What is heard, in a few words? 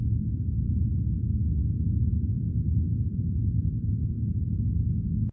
rumble; Original TK Sounds